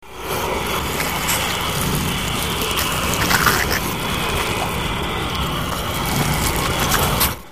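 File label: hive ambience; ns apoc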